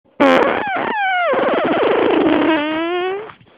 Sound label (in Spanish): laser